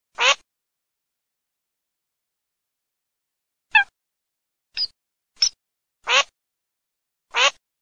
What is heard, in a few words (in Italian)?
FOLAG ALZAVOLA